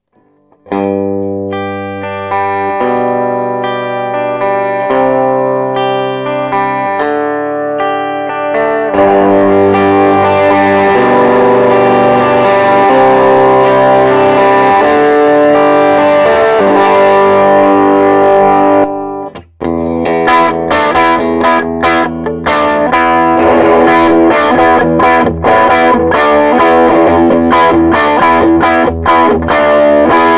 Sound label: BASS DRIVE, guitar